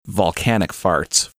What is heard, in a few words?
volcanic farts